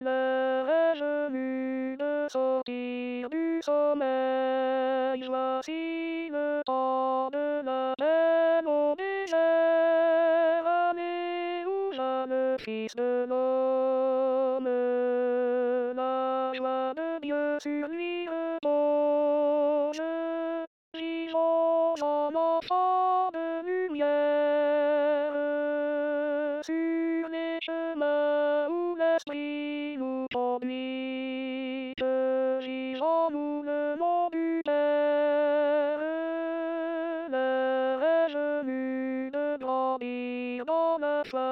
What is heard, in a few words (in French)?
alto